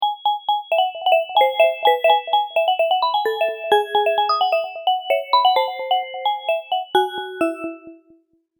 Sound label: ringtone